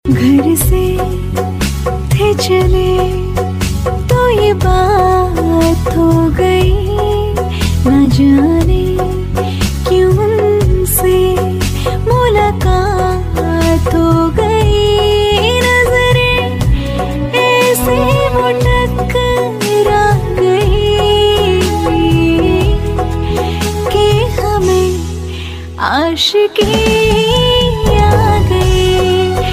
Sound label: New Female Love Song Ringtone